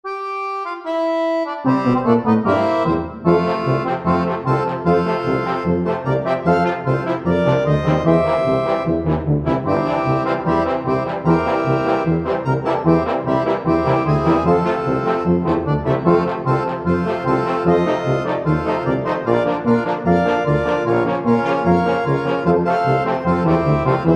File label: German Bavarian Brass Accordion Song